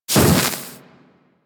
Axe Projectile Impact F; Sundial

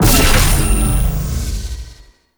Small Gate Swap, Forest; Veilbreaker; Grineer Forest Thumper; Kahl

